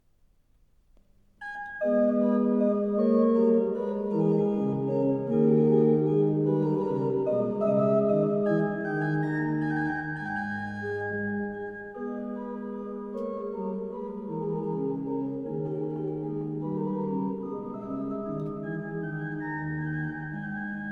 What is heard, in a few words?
Suite N.6 in A min; P. Bustijn